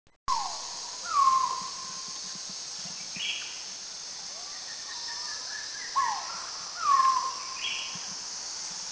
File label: Coral-billed Ground-Cuckoo; Thailand; Khao Yai